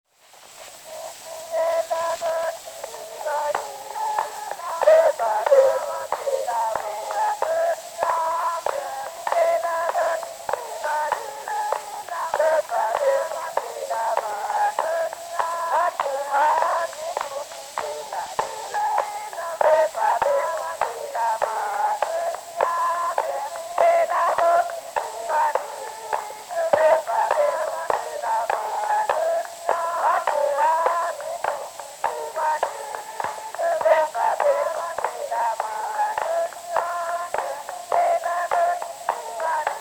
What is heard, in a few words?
Zulu Recordings; Father Franz Mayr